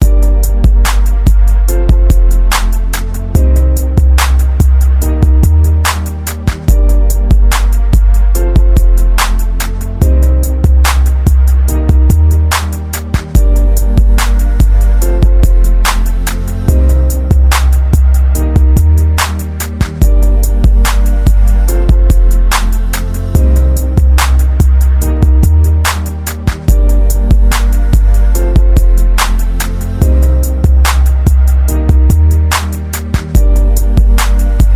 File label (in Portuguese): toques de rap